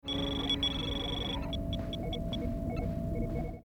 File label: computer sounds